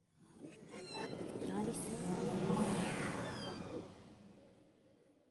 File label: ghosts pass by witchery shiver; sonniss gdc